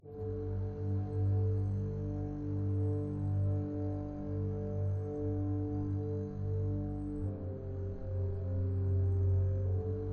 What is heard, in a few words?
Rasta Soft Bass; tintin reporter cigars of the pharaoh